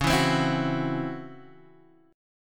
Db Minor Major 7th Double Flat 5th Db down Guitar Half Step Down